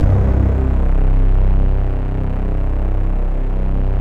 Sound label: SQ1, Synthesizers